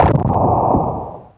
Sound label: thunder hit, spacey drums; Spectrasonic Distorted Reality 1